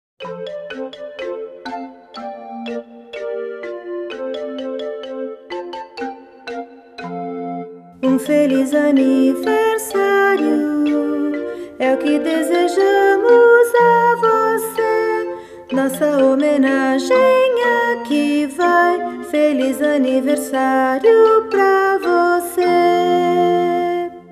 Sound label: happy happy birthday words and music, childrens songbook portuguese